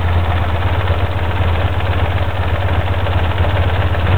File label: rich idle, Carburetor FCR; Tune Up; Carburetor Air Cleaner; setting FCR